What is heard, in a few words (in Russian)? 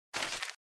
Звук очистки корзины на компьютере